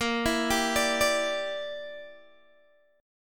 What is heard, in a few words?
Bb 6th Flat 5th Bb, Guitar Open G